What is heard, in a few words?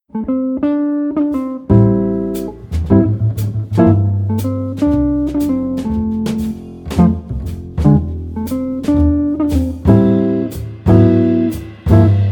Blues